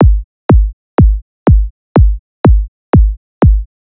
Punch Style; Tremor

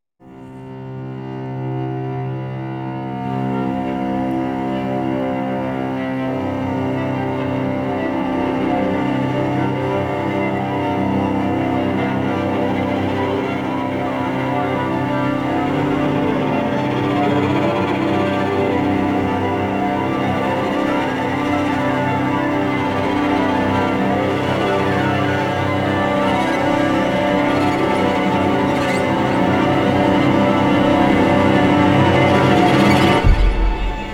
cello extended techniques; oh the horror; cello extended techniques sample library; elastic sounds

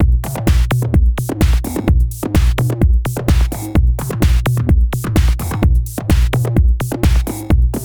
Progressive Tribe; Tremor

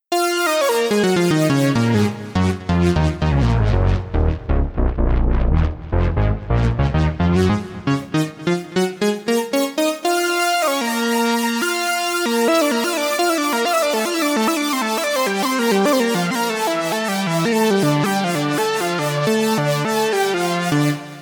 monoglider; the transmitter soundset

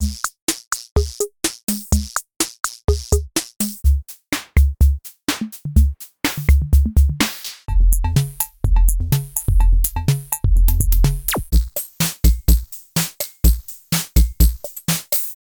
vintage drum machines; Tremor

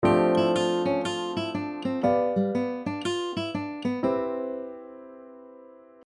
ii V I example

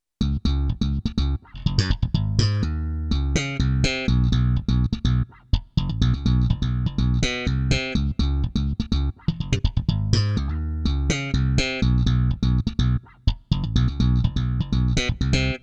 funk

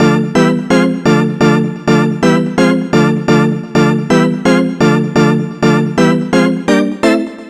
organ hymnal; the producer school

